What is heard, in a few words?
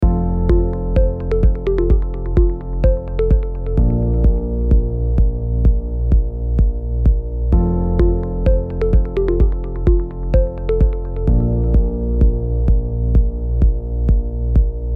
Technology Corporate Background